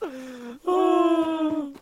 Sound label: sigh